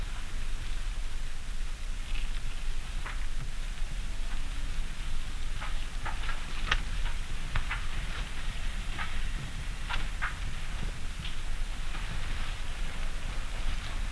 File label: leaves rustling, environment; t2-mapper